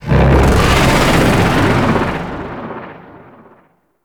Void Dragon Vocal; Ability; Enemies; Vocals; Warframe; Duviri

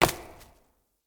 Acorn Impact; Cinematics; Bromius; Bromius Tree Rub; Bromius Quest

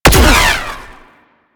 Shocker Fire; Spec Ops

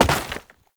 Step Rock, Foley; Warframe; Oraxia

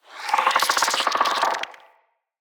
Root Spider Vocal Chatter; Animals; Spiders; Vocals

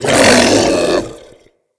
Infested Femur Vocal Attack

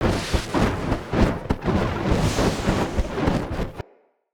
Fire Push Projectile Loop; Powers; Fenn

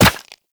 Run Bone, Footsteps; Warframe; Operator

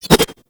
Tonfa Parry Whoosh; Warframe; Tenno

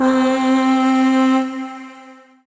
Void Shawzin Oct Two C; Warframe; Zariman

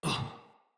Death, Battle Barks; Envoy

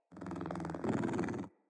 Kitten Growly Purr; Catbrow

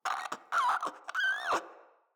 Infested Crawler Breath; Crawlers

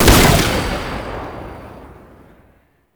Concrete Archgun Charged Shot; Warframe; Archwing

